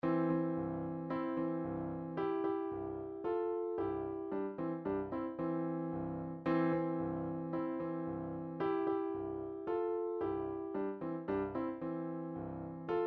bartok ten easy piano pieces hungarian folksong, midi